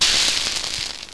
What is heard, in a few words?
sizzle